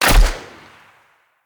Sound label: Bow Shot; Sinecure